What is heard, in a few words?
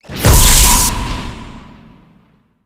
key; dd-thoughtroute